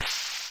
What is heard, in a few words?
player slide; Los Acantilados Rojos; smbx38a; The Great SMBX 38A Contest II